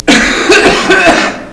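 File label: Man cough; Sound Library 2000; Wayzata Technology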